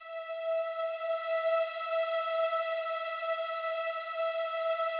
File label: slow strings E4, Yamaha VP-1; 90 s Sample CDs; Music Store